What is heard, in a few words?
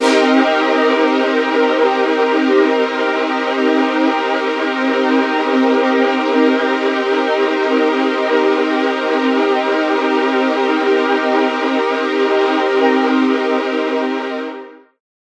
Synth Strings Dry; Arena Reverb; Echo Chamber; Echo Chamber is a stereo reverb and delay plug-in; Syntheway